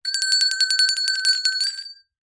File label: bells small hand bell ring in water weird cartoon tone